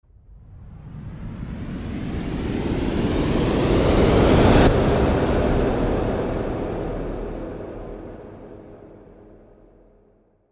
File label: Windburst Rise; Mountain Breeze